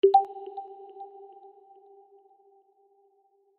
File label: Drip drop